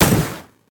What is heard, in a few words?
gun; Fight Knight